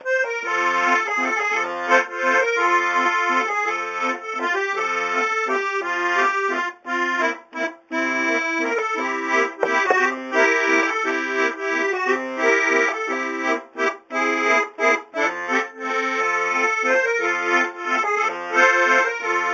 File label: accordion learning a new song